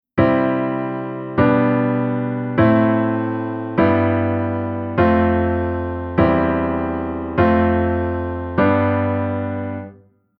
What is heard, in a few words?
Chord Reharmo